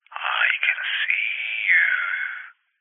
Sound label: human man english sings i can see you creepy; zapsplat